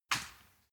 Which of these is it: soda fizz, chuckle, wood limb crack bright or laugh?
wood limb crack bright